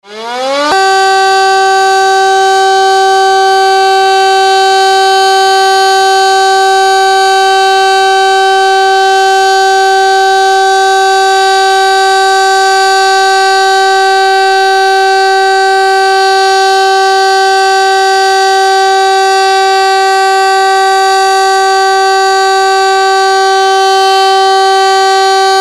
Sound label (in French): Sirene de fin d'alerte